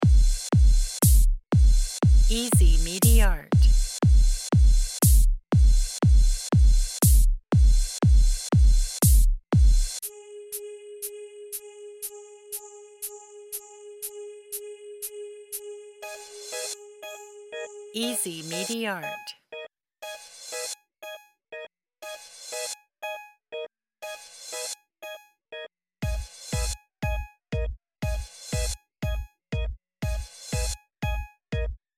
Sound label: horror